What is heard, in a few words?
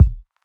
kick; eddyflux